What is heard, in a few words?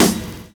VINYL SD, Drums Dance & Groove; USB Soundscan vol.11; AKAI; VINYLKIT